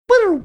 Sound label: making funny sound